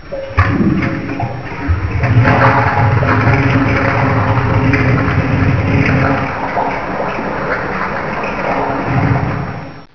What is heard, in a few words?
toilet